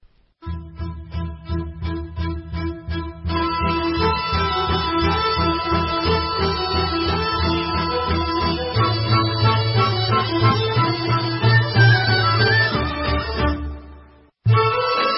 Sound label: Bulgarian Dobrudza Horo